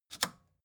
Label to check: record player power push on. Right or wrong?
Right